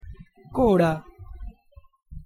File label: horse; kora